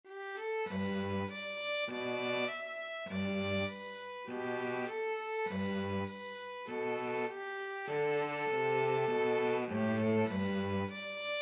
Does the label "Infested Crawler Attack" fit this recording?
No